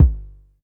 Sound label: Polite kicks long G1; LIMAT Div Dept; Drums